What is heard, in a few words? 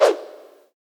Whoosh; Ed Edd n Eddy; Adromulus Pack; EEnE